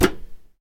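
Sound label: lowering down an iron switch lever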